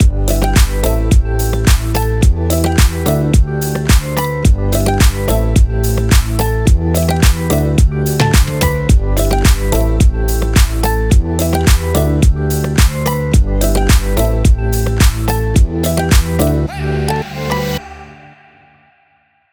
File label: joyful ride